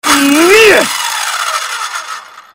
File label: cannibal attack; frank